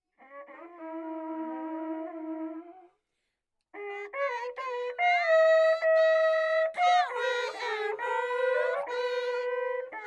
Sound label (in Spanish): Toque Turuwaynu